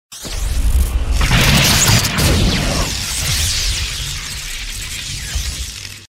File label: bgm; zhuanbei